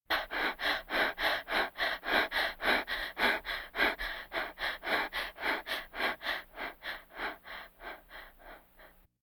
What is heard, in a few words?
Vocal effects breathing Moaning Baby